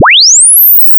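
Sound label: sweep 20-20k; 44.1k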